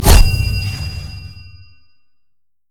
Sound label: summonerspell base barrier; LoL